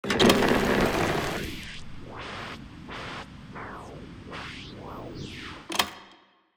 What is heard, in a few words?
Basement Record Player